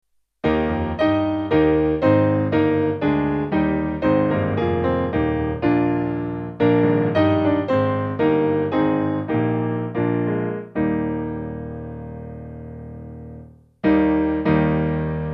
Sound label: Piano